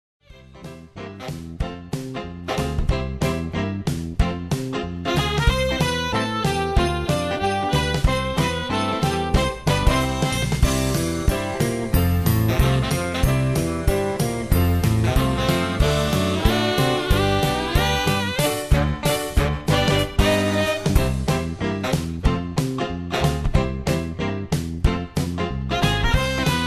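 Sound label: karaoke instrumental; christmas favorites; disney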